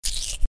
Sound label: Nasty Splat Sound